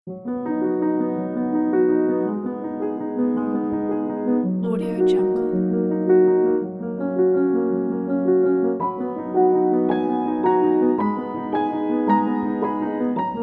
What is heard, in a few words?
flying nature piano melody